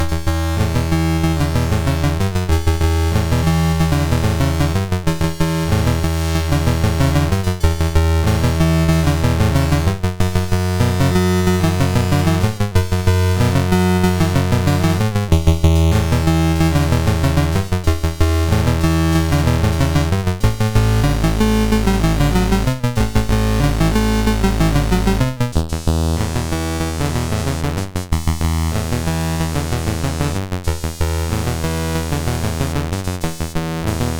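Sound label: Magicians Ball, MOS 6581 SID chip music, games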